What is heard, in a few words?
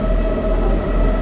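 Starfighter loop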